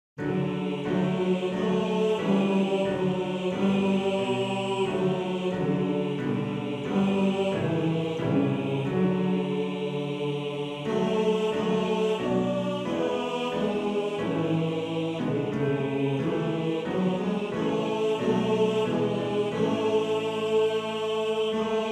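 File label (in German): Motette Tenor 2, Männerchor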